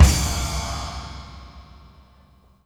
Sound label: Combo Shard, Processed Drumkits